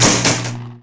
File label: electro